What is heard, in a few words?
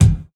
rich mids kick one shot g sharp key